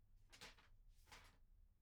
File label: pageturn; IEEE AASP